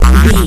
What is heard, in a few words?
retro shield on deflect